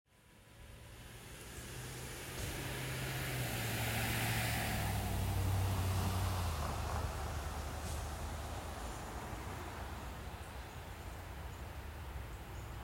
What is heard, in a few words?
Cars